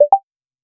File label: ui sound for an education